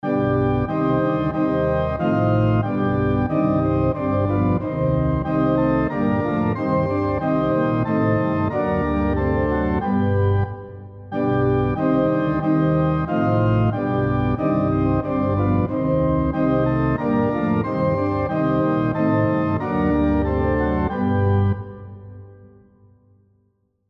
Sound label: SATB on Piano Example